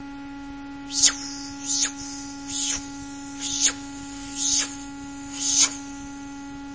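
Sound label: man trying to whistle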